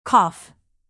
cough

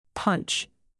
punch hit

